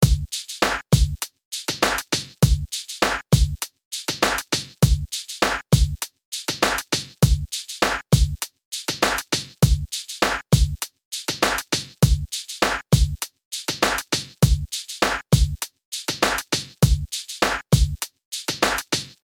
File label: Drums